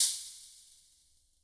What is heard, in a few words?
Guild MG10C-1 Crash, Drum Machines; Guild MG10C-1 Sample Pack; 300 Drum Machines